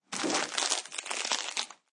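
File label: Grab Plastic Wrap Food, Plastic food wrapping or package such as chips or cookies crinkle; crinkle sounds; Crinkling; Crinkling Sound Effects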